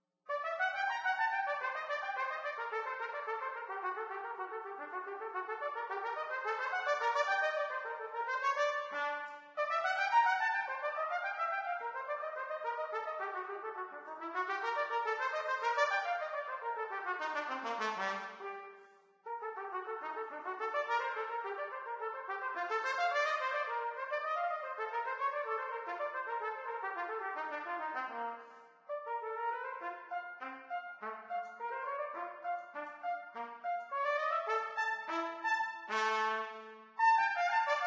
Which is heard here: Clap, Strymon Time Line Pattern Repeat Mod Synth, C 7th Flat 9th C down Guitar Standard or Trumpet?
Trumpet